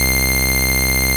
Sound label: BASS BELL; Analogon